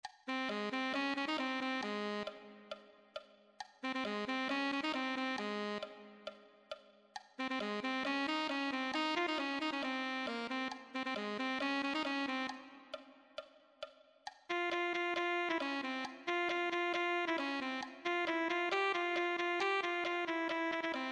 alto saxophone

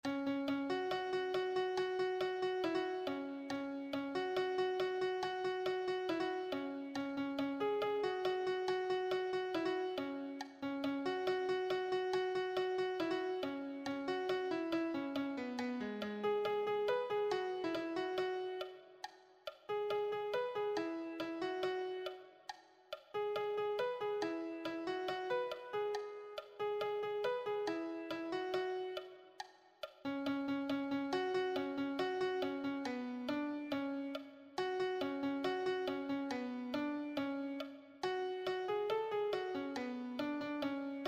melody sheet, piano

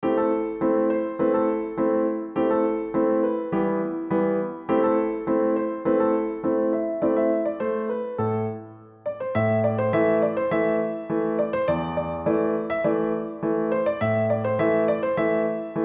solo, piano; Bommarillu

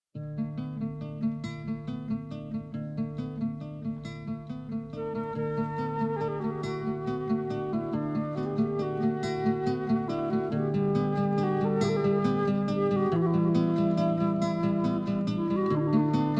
backing track

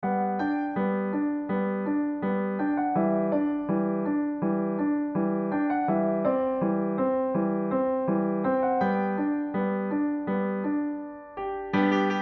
solo, piano; Bareilly Ki Barfi

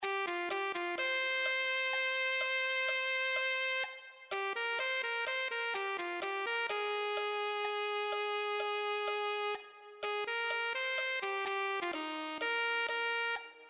alto saxophone

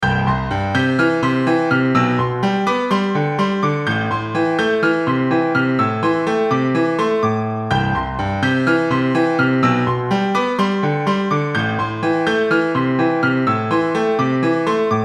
advanced solo, piano

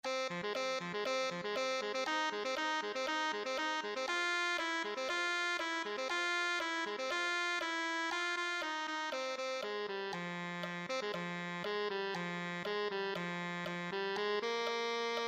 tenor saxophone

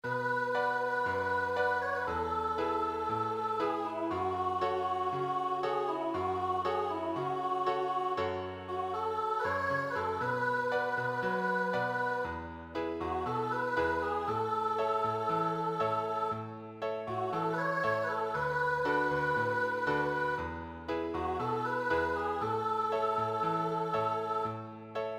accompaniment, piano

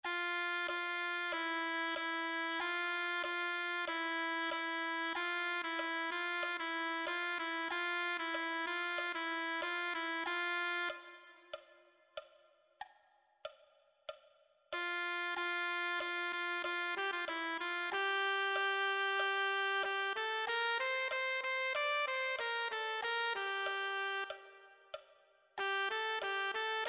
tenor saxophone

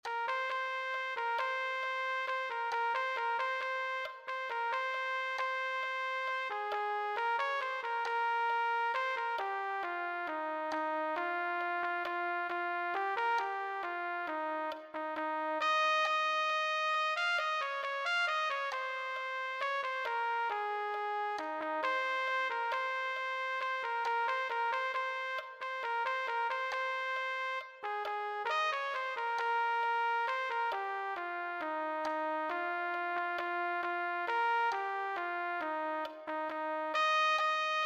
trumpet

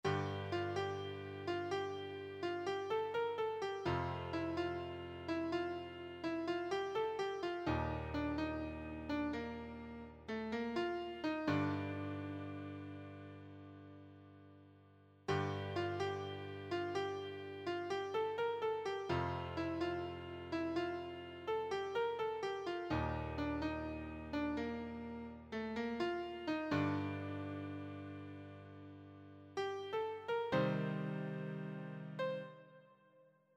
easy solo, piano

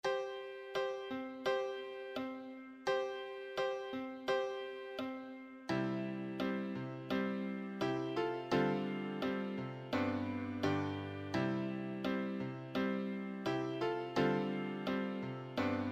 advanced solo, piano

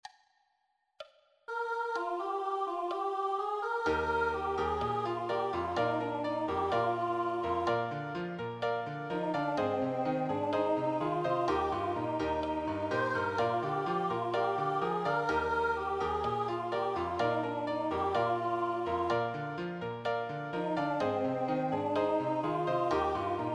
accompaniment

